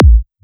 Kick, Psytrance; Drum Shots

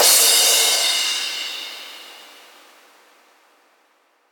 Crash, Cymbals; Minimal House

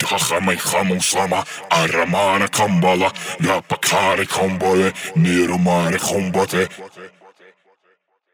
138 Shamanic Voice (Wet), Psytrance

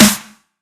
Snare, Psytrance; One Shots; Claps Snares

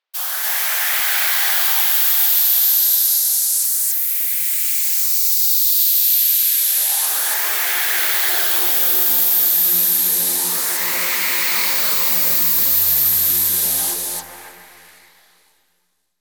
Noise FX; Singomakers; Infected Psytrance

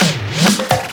Minimal Fills, Minimal House; Minimal Loops

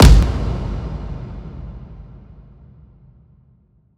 Impact; Singomakers; Infected Psytrance; Impacts

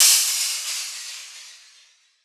crash, cymbals; Singomakers; Psytrance Mantra; Drum Shots

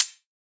HiHat, Psytrance; Drum Shots

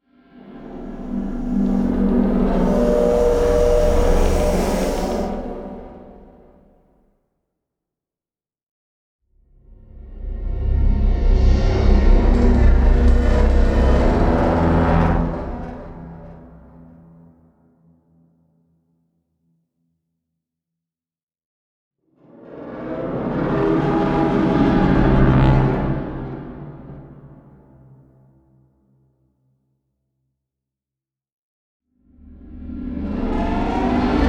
whoosh ghost brass

